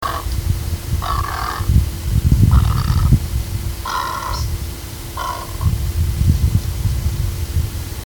grande aigrette, chant

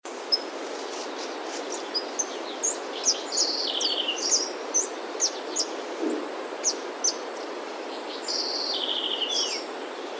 ariane du perou, chant

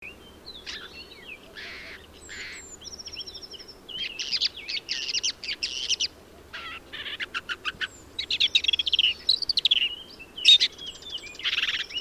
pie-grieche a tete rousse, chant

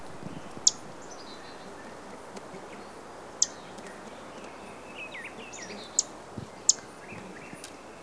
paruline des pins

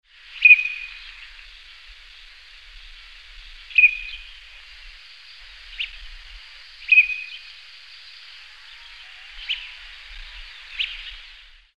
sarcelle tachetee

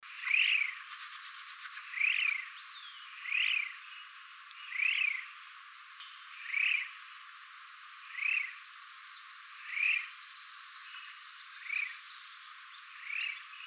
nette brune

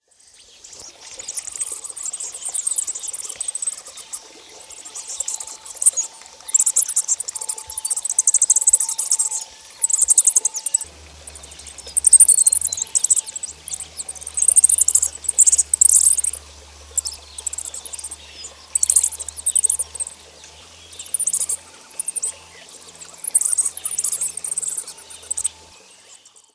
martinet de l assam, chant

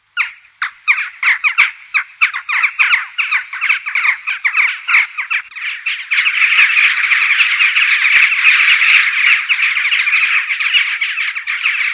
choucas des tours, chant

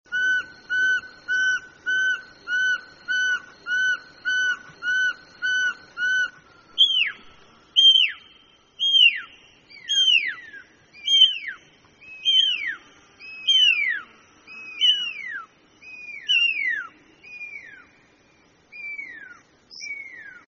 chevechette perlee, chant

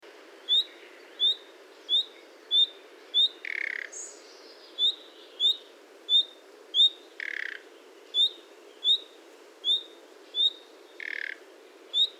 rossignol philomele

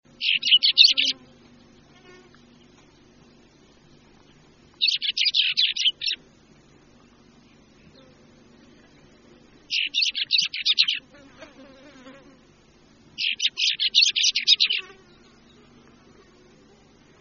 traquet tractrac, chant

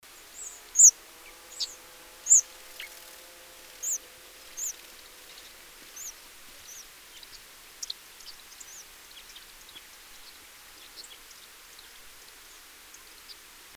grosbec casse-noyaux, chant

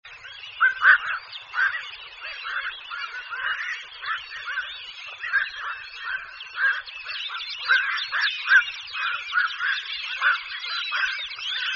bihoreau gris, chant

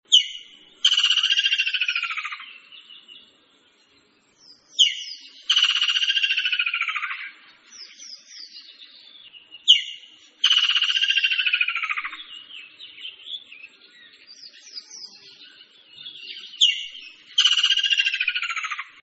martin-chasseur du senegal, chant

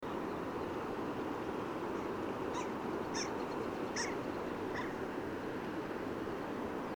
arlequin plongeur, chant

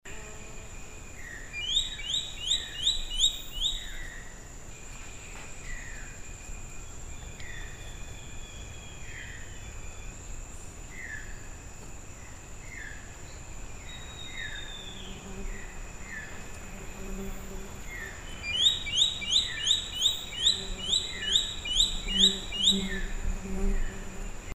autour a ventre gris, chant

